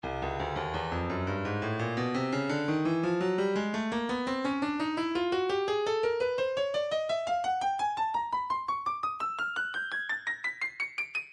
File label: Percussion Bongos, midi; Stylus Percussion Midi Files Bongos 085